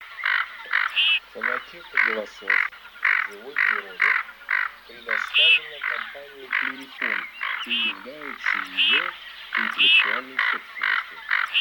Гоголь Quattrocchi; plurifon

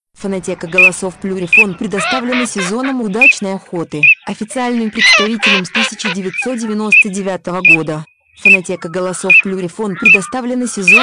чирок-трескунок; plurifon

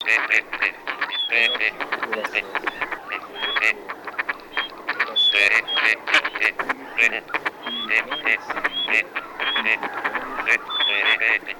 Серая утка Canapiglia; plurifon

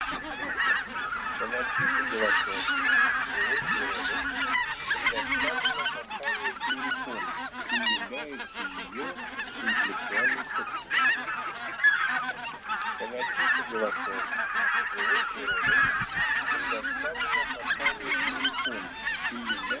Гусиный базар

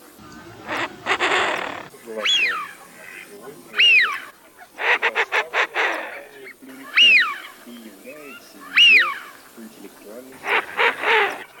Свиязь Fischione; plurifon

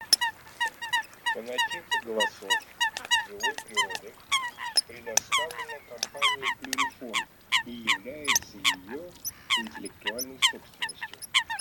Лысуха Folaga; plurifon

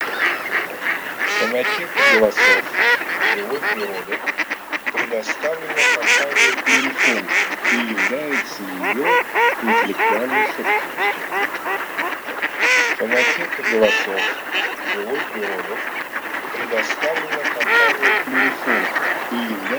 Кряква базар Germano; plurifon

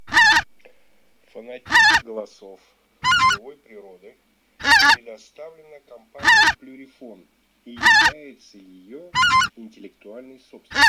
Белолобый Oca Lombardella; plurifon

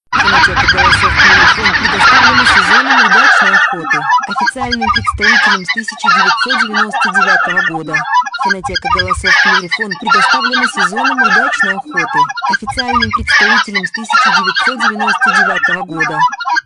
белолобый; plurifon

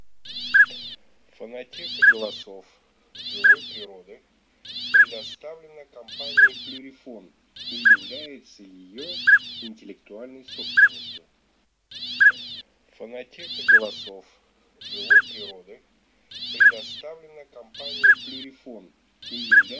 Шилохвость Codone

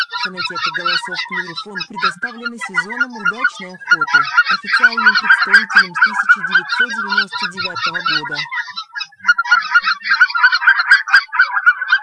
Гусь белолобый

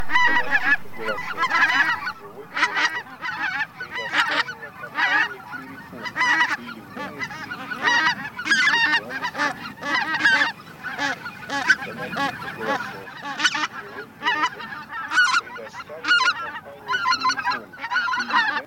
Белолобый базар Oche Lombardelle

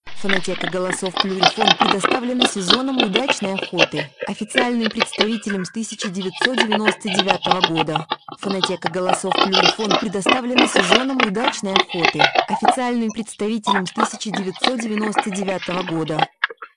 кабан; plurifon

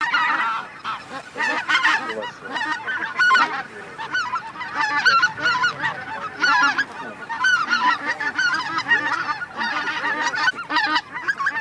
Белолобый базар Oche Lombardelle Гусь серый базар Oche Selvatiche; chip mini 234 plurifon

